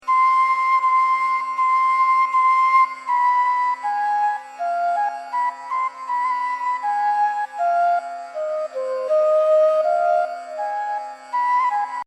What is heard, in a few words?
Tintal, tabla; 1 cycle